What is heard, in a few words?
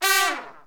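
Brass Trumpet FX; Tpts; Roland L-CDX-03 Disk 2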